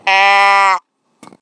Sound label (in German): Brumm Stimme